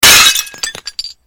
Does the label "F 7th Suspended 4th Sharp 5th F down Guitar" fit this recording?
No